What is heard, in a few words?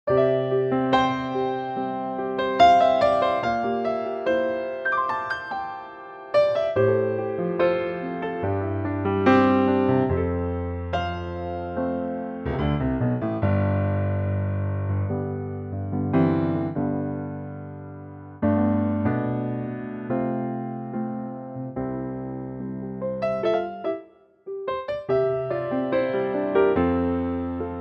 C piano